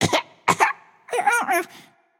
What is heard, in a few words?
clear throat, voicepack; smite; jorm